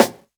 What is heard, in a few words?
Snare Vinyl, drums one shots